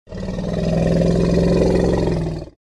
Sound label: African elephant growling